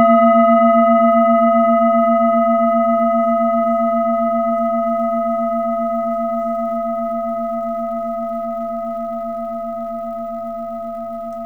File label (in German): assam klangschale